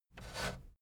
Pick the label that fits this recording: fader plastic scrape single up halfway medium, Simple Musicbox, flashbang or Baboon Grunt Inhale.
fader plastic scrape single up halfway medium